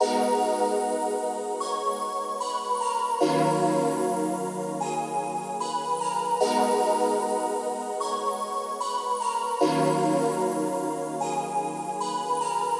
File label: melody loop (150 bpm); drumkits; loops; fl studio; xander brown drumkit